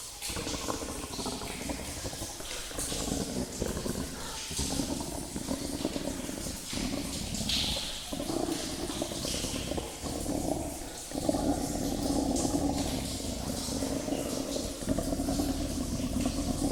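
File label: creature in a cave without click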